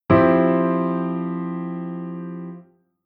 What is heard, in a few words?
Chord Hybrid; Jissen